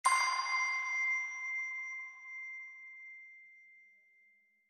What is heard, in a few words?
Button